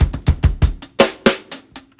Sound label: 120 TRIPLET KICK, DRUMS LOOPS; BASEMENT DRUMS VOL 2